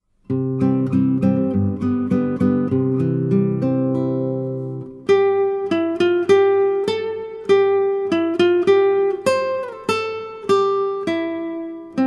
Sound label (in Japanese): ギター